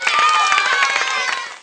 Cork; Print Artist Demo

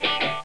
noiz; Music Toys; star

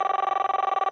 phone; The Sum of All Fears

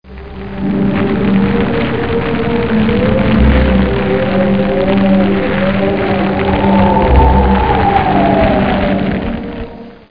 Hell Amb

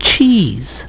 ROB NOISE

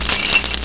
keys; Grunt

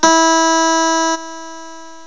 harpsic; notes

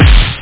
snare

